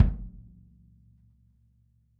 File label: kick